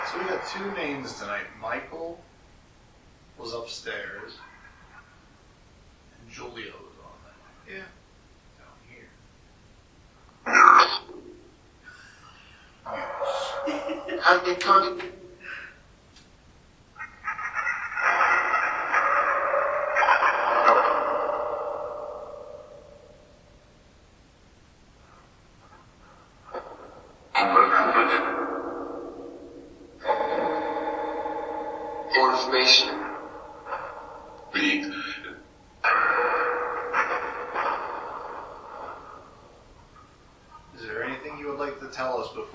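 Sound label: 1st Floor Doll Room Phasma Box; fairfield county infirmary investigation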